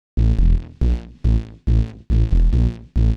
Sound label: SNTH BASS TEKNO 140 A